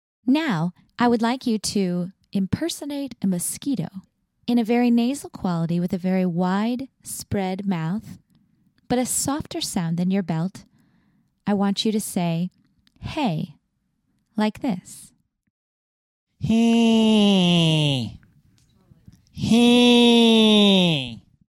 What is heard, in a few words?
Mosquito